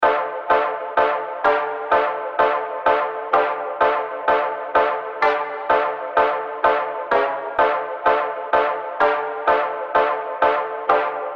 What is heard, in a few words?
Brass Loop Dmin 127 BPM